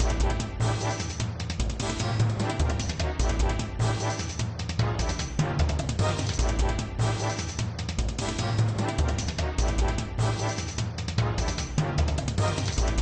action, music; WILLIAMS; INDIANA JONES; Indiana-Jones-Original

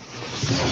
door opening; sfx; WILLIAMS; INDIANA JONES; Indiana-Jones-Original

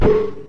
bonk; BALLY; TWILIGHT ZONE; Pinsound; Twilight Zone Reorchestrated

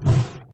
door closing; sfx

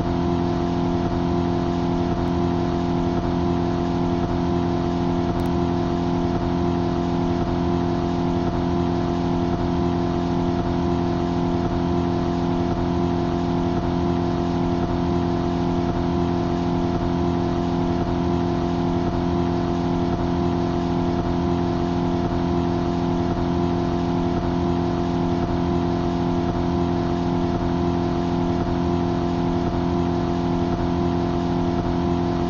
engine ambiance; BALLY; CORVETTE

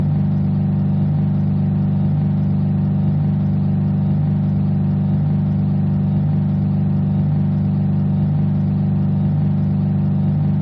engine ambiance; BALLY; CORVETTE

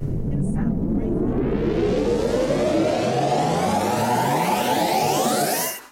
multiball start; BALLY; TWILIGHT ZONE; Pinsound

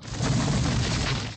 crash; Williams; Indiana Jones; Indiana-Jones-Original

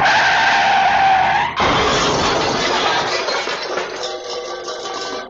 braking car; BALLY; CORVETTE; braking car crash

